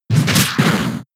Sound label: Kick; pokemon sfx gen 6 attack moves xy oras; Rolling Kick